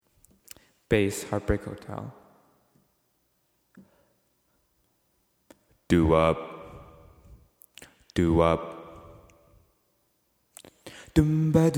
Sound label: bass two